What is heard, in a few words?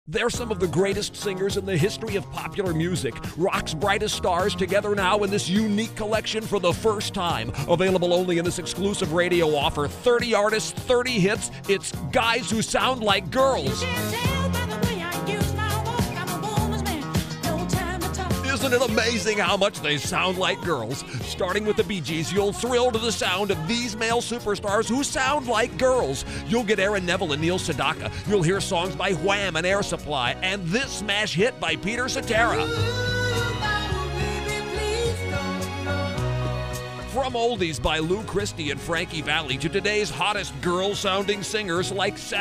Guy Girl Singers